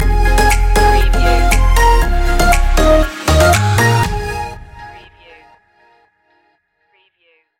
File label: tropical summer stinger